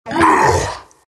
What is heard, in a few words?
morph grunt; terrorblade